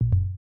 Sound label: deaf; fluxer